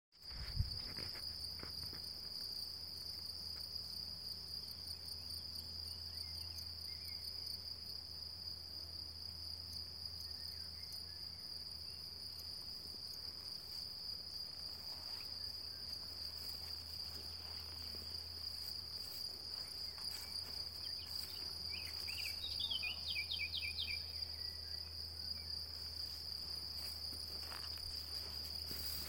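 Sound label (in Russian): сверчки или цикады в Каринтии Австрия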